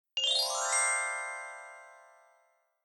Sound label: Sound Effect Grin; English Prep Package; RexDoesTricks